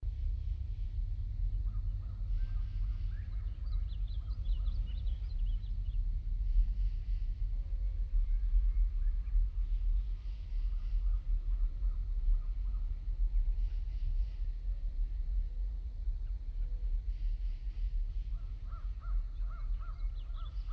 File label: Mountain Pass Wooden House